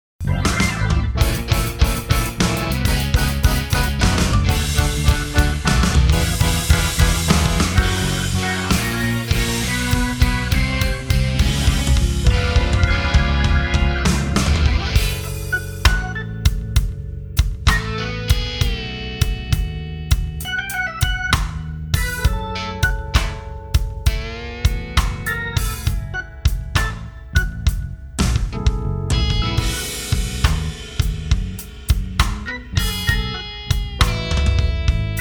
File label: MIDI blues; spellman studio